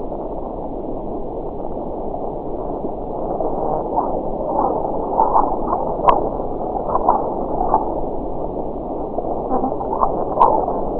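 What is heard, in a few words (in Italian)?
sonificazioni eventi sismici; Grecia Magnitudo MW 6.3 Isole Ionie 26/01/2014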